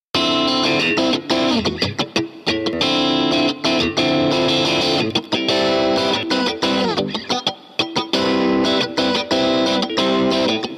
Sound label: guitar